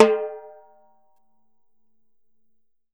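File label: TIMBALE, Salsa Kit; E-MU Producer Series Vol. 7 – Old World Instruments; Drums&Percussion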